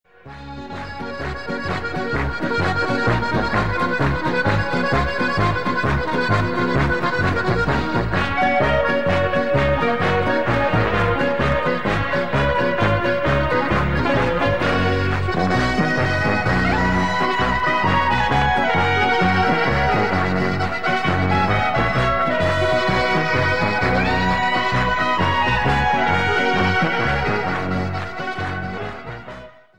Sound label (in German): Trompetenecho